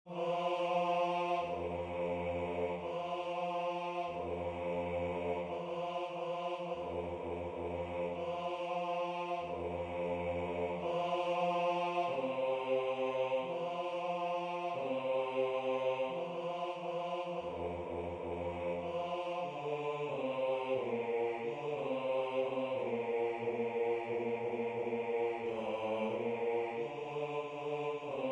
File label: Bass